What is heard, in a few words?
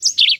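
bird environmental; CS Level2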